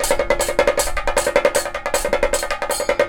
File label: KATAK, Indian Traditions; USB Soundscan vol.45; AKAI